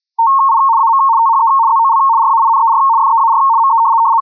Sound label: rtty; fldigi